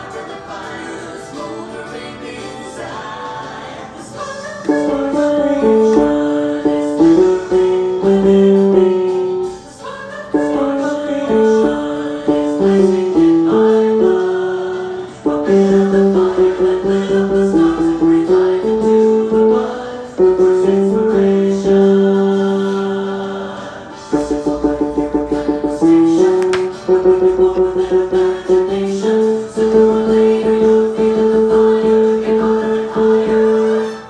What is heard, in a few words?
Bass